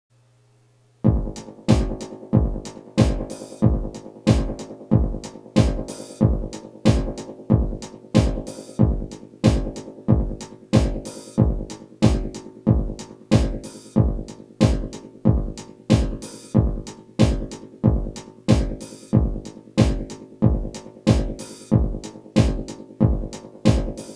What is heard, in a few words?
Drum